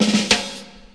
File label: Rimshot